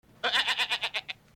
goat reply fast

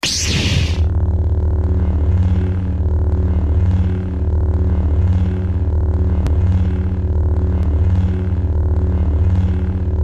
saber ignition

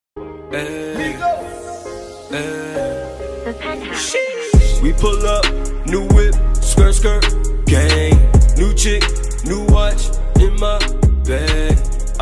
rap kick

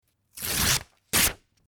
paper rip

